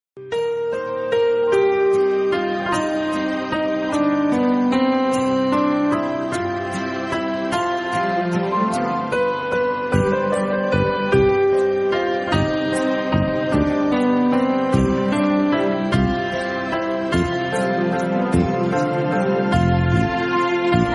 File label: instrumental song love music tone, Rabindra Sangeet ringtone